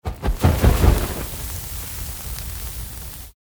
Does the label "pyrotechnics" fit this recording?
Yes